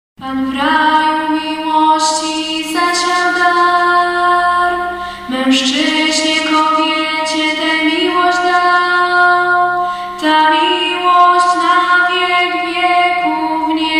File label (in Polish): Alleluja, schola; stary zmigrod